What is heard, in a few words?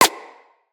Bullet Impact Generic